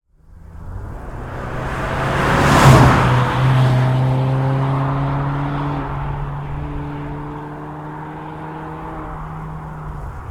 Mazda 6 III 2.0 Skyactive G exterior passby high rpm whoosh; mono; Soundholder